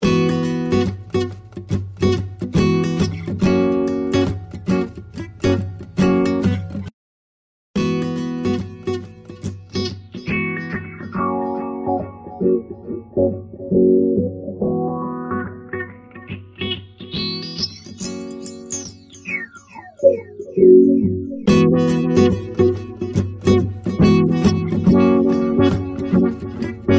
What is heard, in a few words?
input guitar